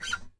SQK, guitars; Spectrasonics; Hans Zimmer Guitars VOL-2; FNGSTYLE PFM

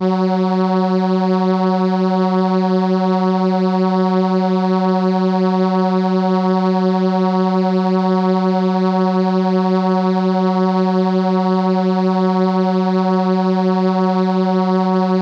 Trumpet F#4, ARP Solina

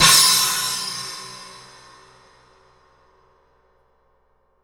China 18 FF, Cymbals; Big Fish Audio; Ross Garfield Drums VOL-2

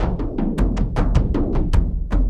Frame Settle, Kendang; Sonic Foundry (Sony Creative Software) - Friendly Fire; Drums

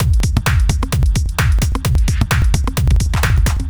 Fill, Drum Loops 130bpm; Zero-G; Soundclash Nu Dance Electro; Acidized Wavs; Hard Mean

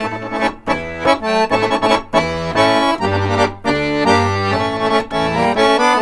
ACC, Balkanish Traditions

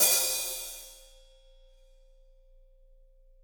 HH, Drums; Bob Clearmountain Drums I

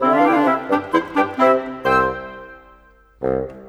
Cinematic Winds; Sonic Foundry (Sony Creative Software); Orchestral Series VOL-3; Cinematic 20

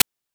PITCHED NOISE; NES DPCM; MIDINES

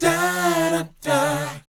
BOYZ B, Vocal Planet R&B & Dance; ILIO

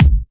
Bassdrum; Best Service; House Definition VOL-1

